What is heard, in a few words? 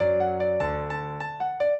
piano; Mozart